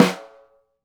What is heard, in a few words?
SNR, Synclavier Percussion 2 - World & Orchestral